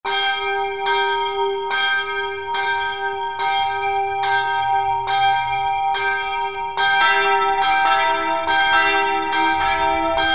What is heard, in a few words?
Do2 Mi2 Sol2